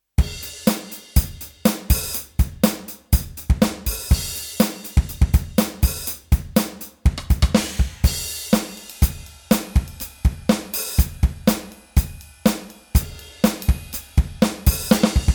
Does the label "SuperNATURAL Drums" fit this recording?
Yes